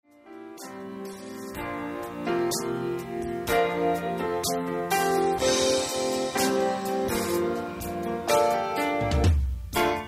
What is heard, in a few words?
instrumental